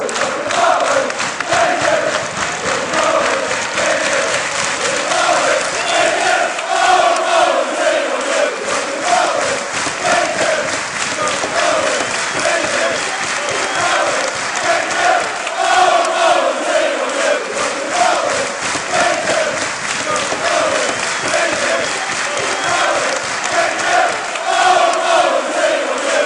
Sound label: we love you bohs, chants